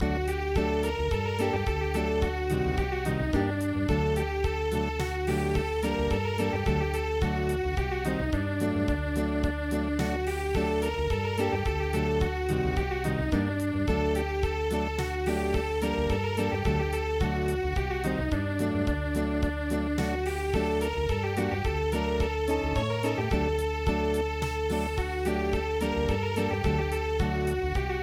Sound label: Unplugged